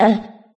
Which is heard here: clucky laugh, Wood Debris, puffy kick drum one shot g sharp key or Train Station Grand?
clucky laugh